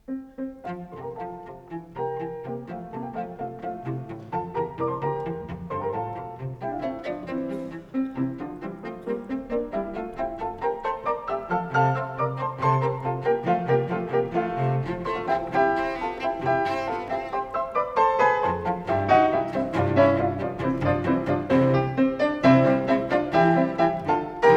in F Major, Cello; 12 Variations on Ein Mädchen oder Weibchen from Mozart's Die Zauberflöte

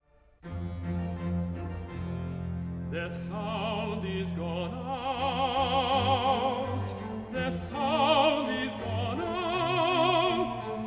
Chorus; Their Sound Is Gone Out into All Lands; Sir Neville Marriner; Handel Messiah

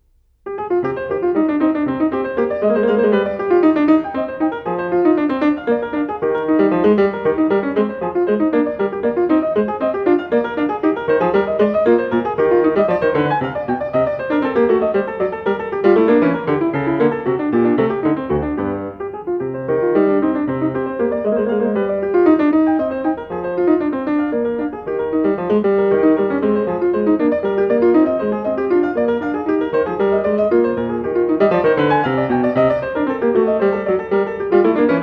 Courante; Glenn Gould; Bach The French Suites Nos. 1-4, BWV 812-815

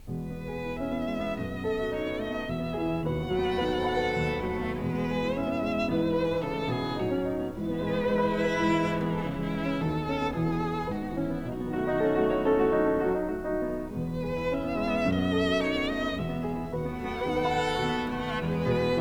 Piano Trio in A Minor; Cello; Op. 50 II. (b) Variation I

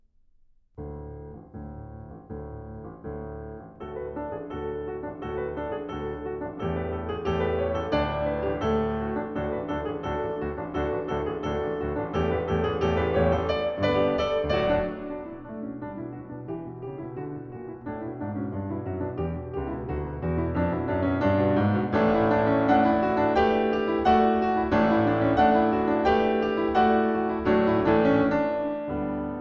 Halling, Piano; 25 Norwegian Folk Songs and Dances, Op. 17; Grieg

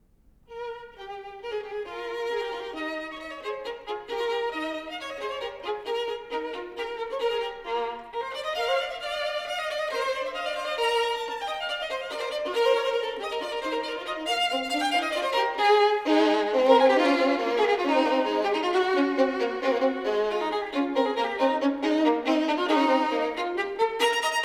Duos for Two Violins, Serbian Dance

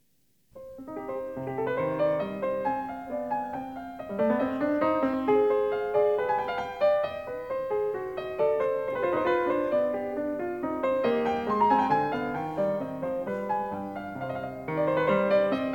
Courante

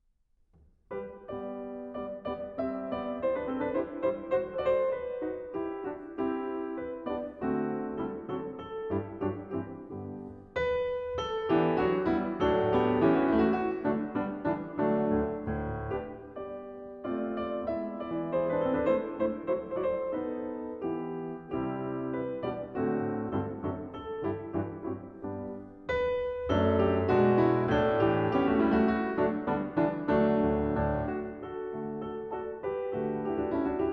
Wedding Recessional March, Piano; 25 Norwegian Folk Songs and Dances, Op. 17; Grieg

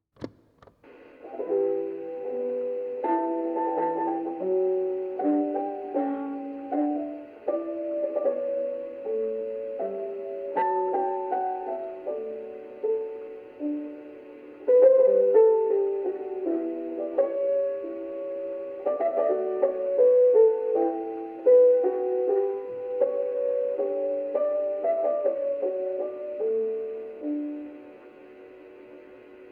Goldberg Cassette Prelude; Bach Jazz; BWV 988

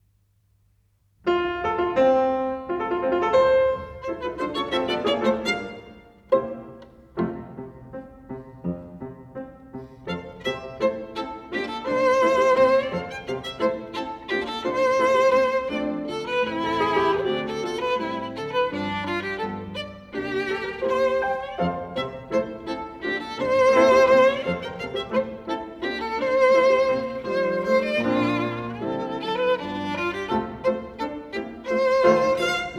Toy Soldiers' March

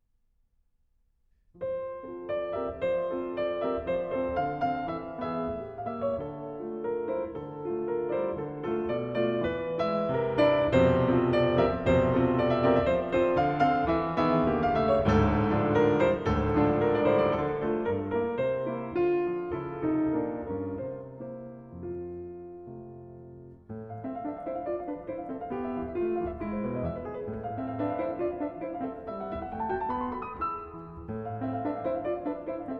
Allegro Moderato, Piano; Poetic Tone-Pictures, Op. 3 V.; Grieg Complete Piano Works

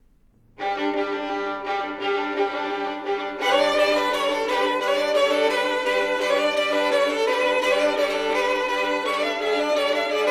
Duos for Two Violins, Bagpipes

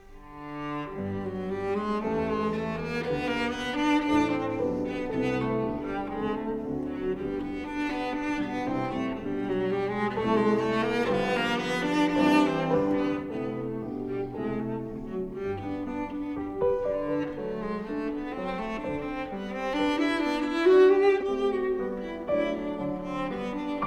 in G Major, Cello; 10 Variations on Ich bin der Schneider Kakadu; Op. 121a Variation III

